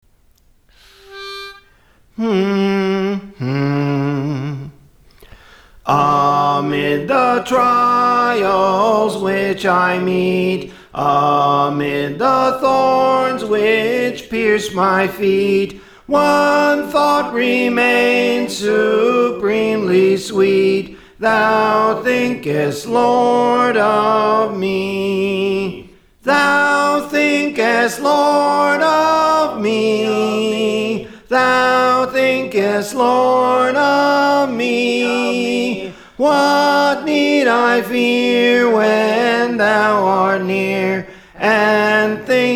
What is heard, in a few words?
Soprano; Thou Thinkest Lord of Me; Praise For The Lord Training